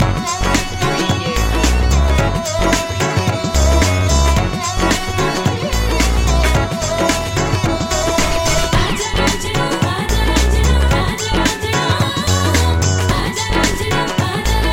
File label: mumbai groove loop